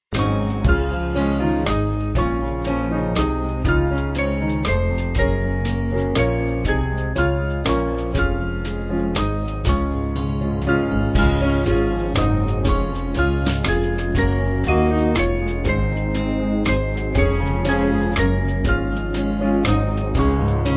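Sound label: Band, Music Backing; Stracathro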